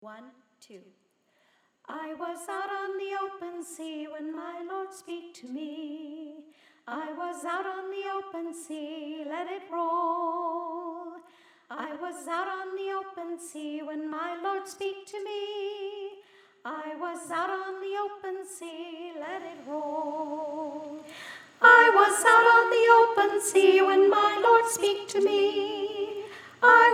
out on the open sea high harmony

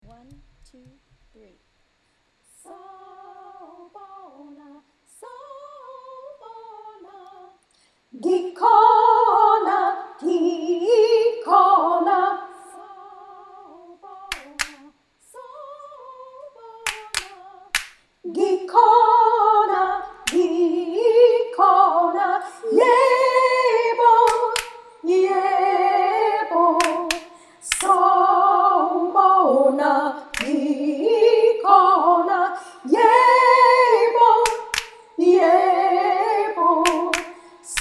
sawubona low

